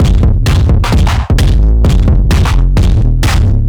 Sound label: Beat Drive 130, distorted drum loops 130bpm; musicradar